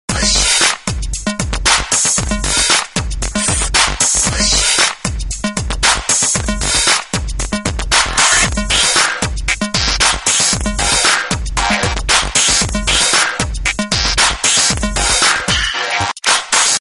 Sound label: Ringtone, Samsung GT-C3510